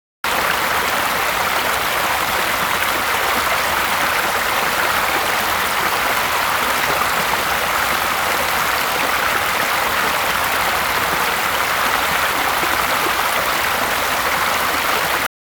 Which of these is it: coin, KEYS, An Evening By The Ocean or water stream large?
water stream large